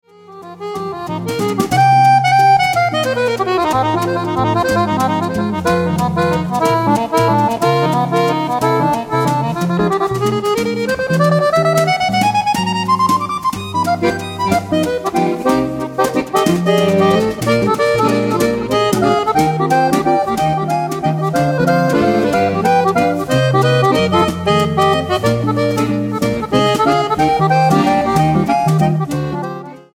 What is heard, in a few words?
blues